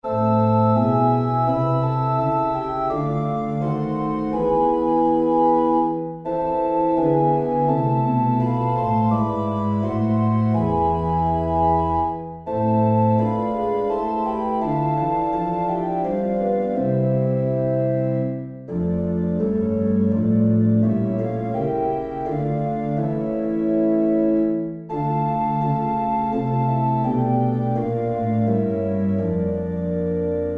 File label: organ; Christus, der uns selig macht